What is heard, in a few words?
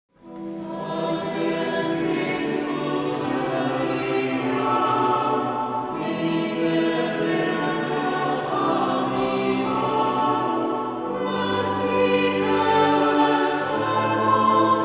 choir; vstal jest